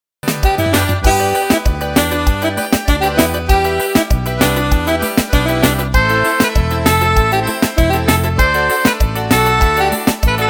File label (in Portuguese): eletro forro arrasta